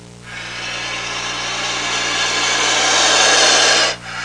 Empty Recycle

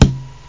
bdrum; OctaMED SoundStudio V1; jazzies samples

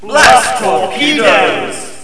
blast torpedoes; SOTS2; Soldiers 2

